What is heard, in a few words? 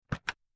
ricecooker open